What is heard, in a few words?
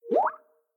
Notification; Skype